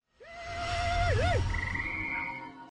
angry snake bites; turtleman; call of the wildman

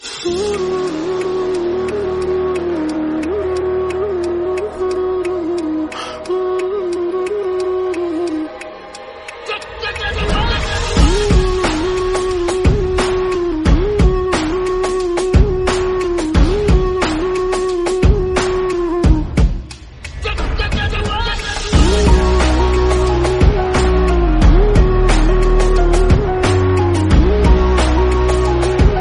incoming call ringtone

